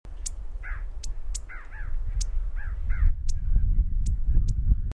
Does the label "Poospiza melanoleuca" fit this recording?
Yes